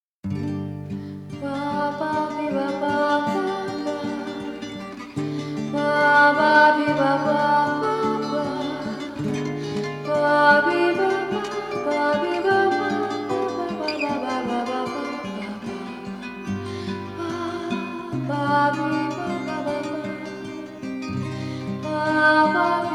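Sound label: vocalise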